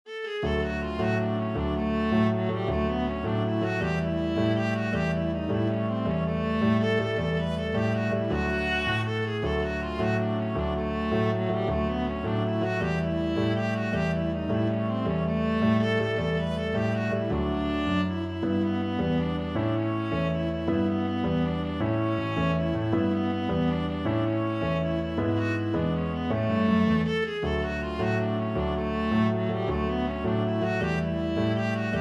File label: mexican hat dance, viola